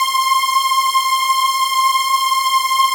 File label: OB BIG P; Synclavier Sampler Library